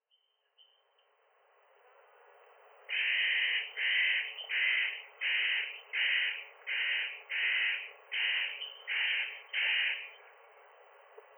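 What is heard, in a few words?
Spotted Nutcracker, Osado; hoshigarasu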